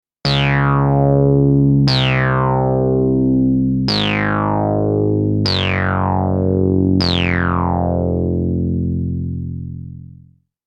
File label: Bass reso high